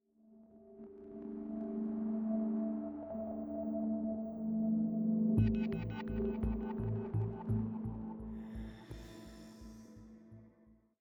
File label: 85bpm; Legends of Pegasus; windows gamerip 2012; Research High